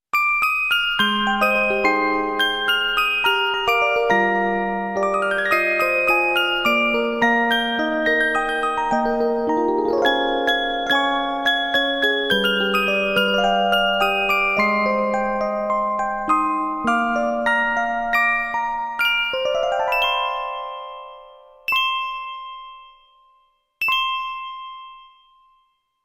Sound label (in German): Orgel